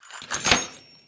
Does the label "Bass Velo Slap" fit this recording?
No